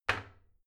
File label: toilet seat slam soft; haus-garten; badezimmer